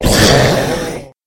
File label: monster salamander